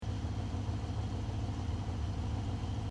Chevy V8 Hot Rod Engine Loop Idle, Short looping sound of Hot Rod engine, idle, turnover; Hot Rod sounds; Car Sound Effects